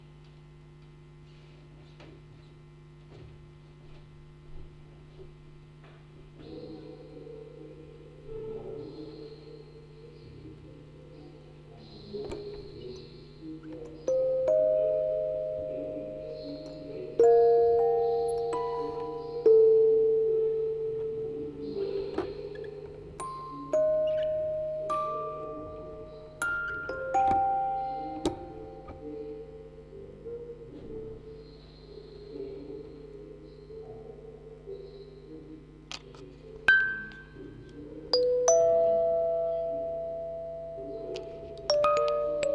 Aufnahme Spieluhren Quartett 4 Kanal stereo; Tonband; Fieldrecording

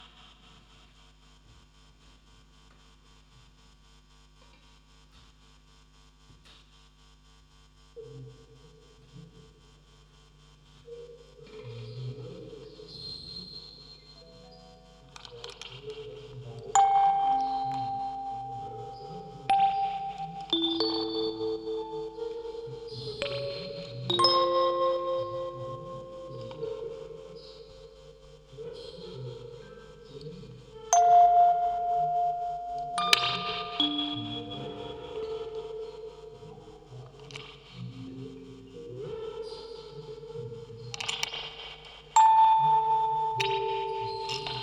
Aufnahme Spieluhr 4 Kanal stereo; Spieluhren-Quartett mit Tonband, Vocoder und Fieldrecording; Spieluhr Set-Up 2

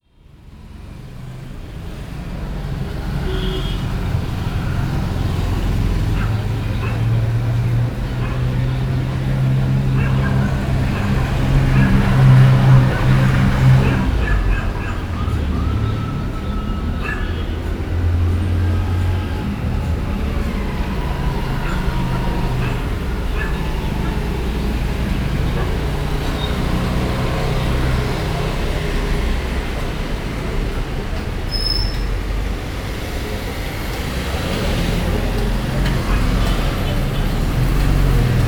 port blair, aufm balkon; Indien; 4 Kanal Audio; ZOOM; fieldrecording

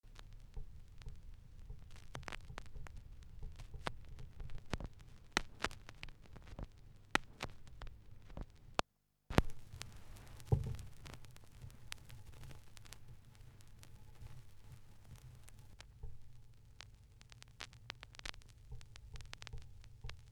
schallplattengeraeusche, Mix

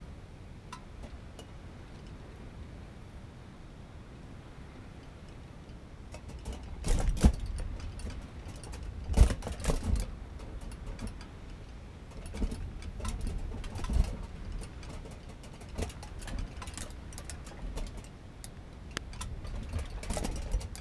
Wind - Alu; 6 Kanal stereo; Curacao; Fieldrecordings

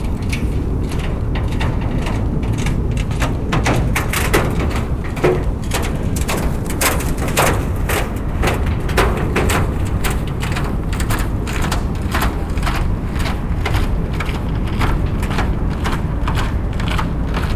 Bruecke mit Schnee + Zug; London; Walkman Performance

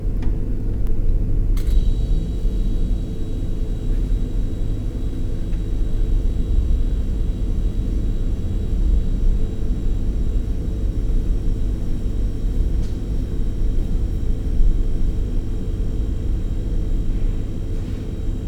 kuehlgeraet, verkehr; Fieldrecordings

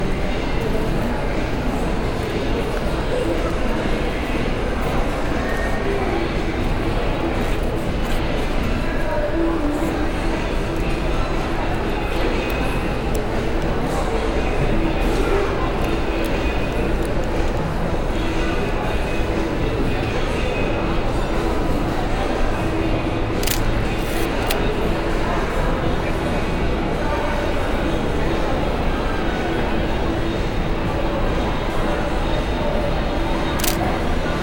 Foto Objekt, Fieldrecordings; Brasilien

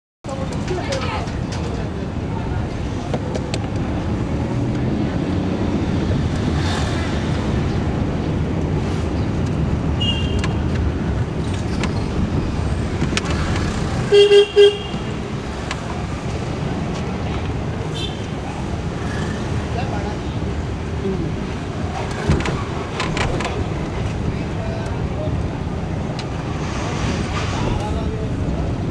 Fahrt, fieldrecording, Boudha, Kathmandu, Nepal; Original Video Soundtrack

